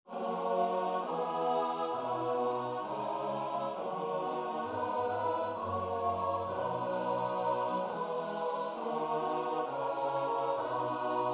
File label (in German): komm gott schoepfer heiliger geist nach bwv 370 sopran